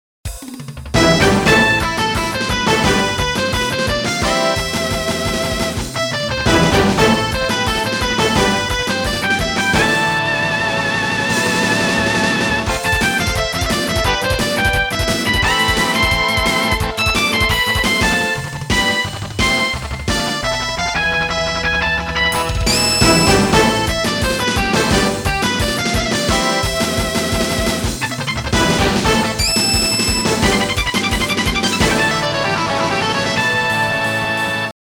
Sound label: MIDI Microsoft GS Wavetable Synth; Rosenkreuz Stilette